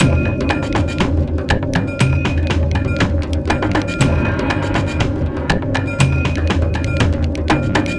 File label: ambient mood, loops